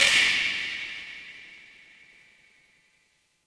fire electric spark

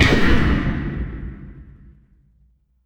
magic scifi laser

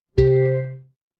ui alert

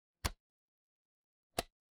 ui toggle

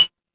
ui chime

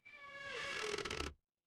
door creak

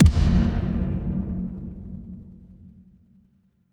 transition impact hit

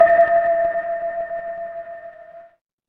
instrument singing bowl

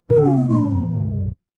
feedback fail

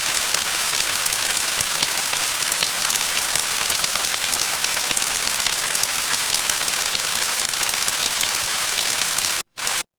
ambience rain

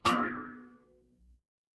cartoon boing

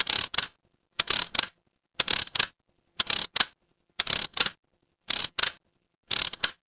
mechanical gear

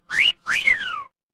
cartoon slide whistle

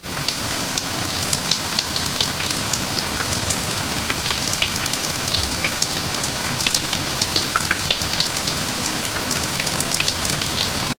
ambience rain

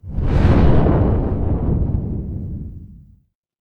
magic scifi rocket

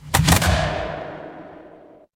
door chest open

